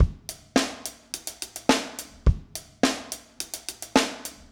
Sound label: Garage Funk Drums, 70s Analog Funk; Sonic Foundry (Sony Creative Software); What it Is!